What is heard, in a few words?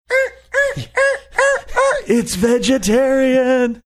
making series of funny sounds saying its vegetarian